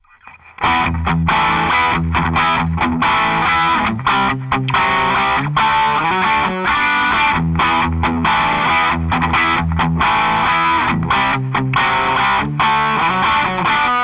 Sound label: rock; Eminence Legend EM12N